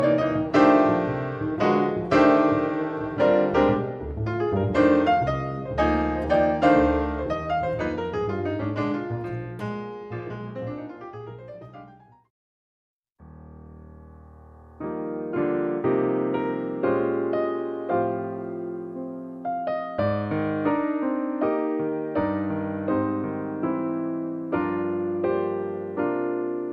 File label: Jazz Medley